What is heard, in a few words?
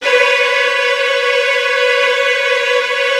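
Virtuoso Strings, violin trills, B2; ILIO; Kirk Hunter Virtuoso Strings VOL-1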